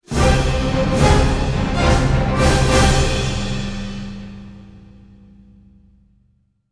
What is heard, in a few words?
cadence with hit